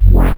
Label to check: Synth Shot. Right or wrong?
Right